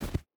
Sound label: footstep hide snow jump land; Rust